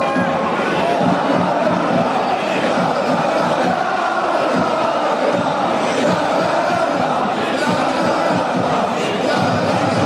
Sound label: chant; fc copenhagen